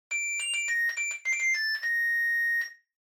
Washer Front Load Beep Melody

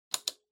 Stopwatch Mechanical Start Table

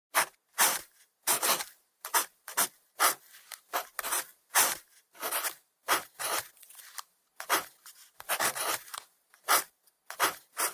Mechanical Pen Write

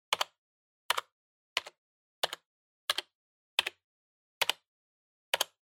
PC Keyboard Single Mid

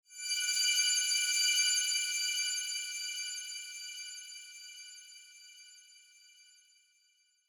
Onoma Sparkle Slow Long

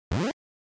NES General Pitch

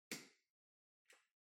Light Switch Wide Panel, Far Hall Gentle

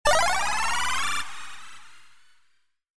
Magic

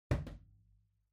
Vacuum Cleaner Far Put Down

